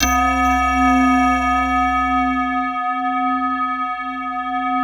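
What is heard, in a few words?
PAD, atmospheric pads; Best Service; XX Large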